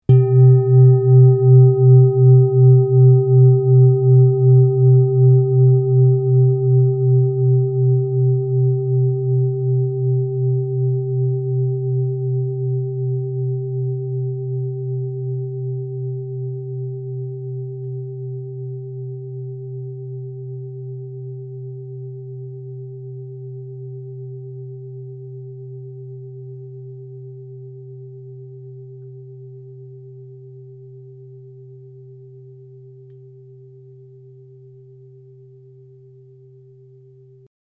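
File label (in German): klangschale sonne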